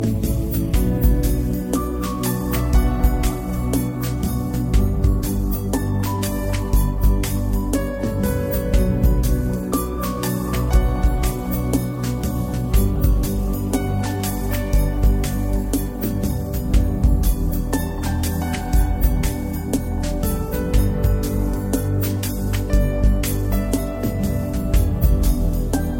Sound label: chillout remix ringtone